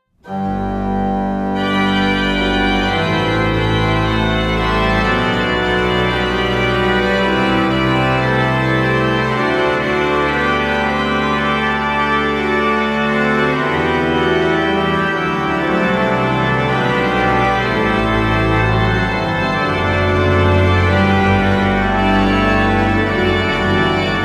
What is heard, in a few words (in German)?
Physharmonika; Physharmonica